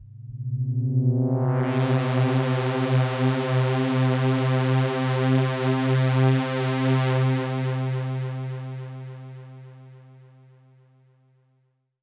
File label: Pad C, Synth Prophet One Shots; Electronisounds